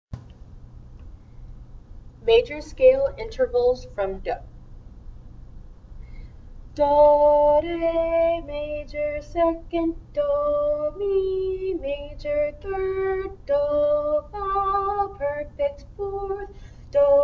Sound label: major scale intervals from do